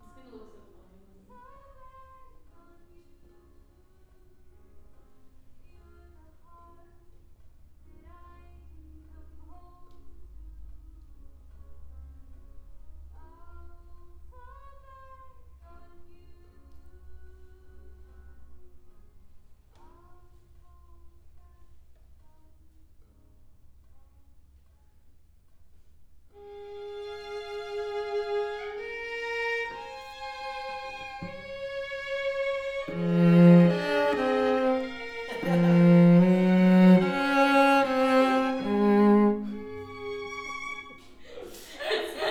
Cello

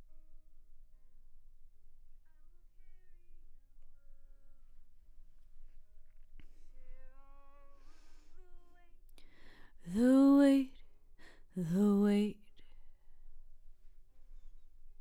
OMG VOX

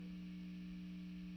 GTR

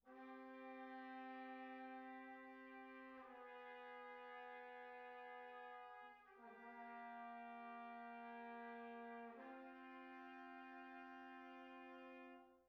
Tenor

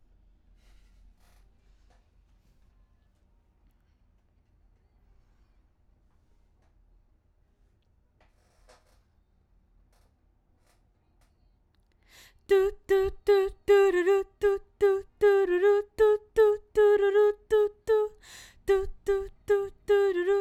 BG Vox

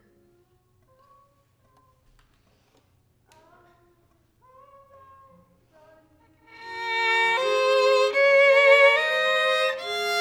violin

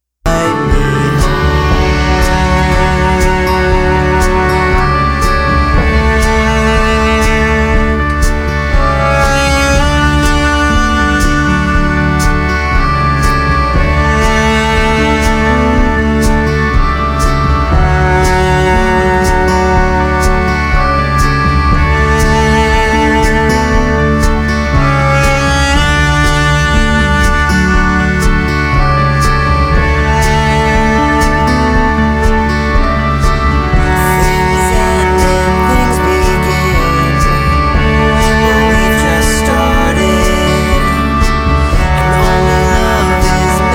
String

